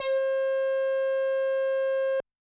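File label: synth brass; Yamaha DTXpress-II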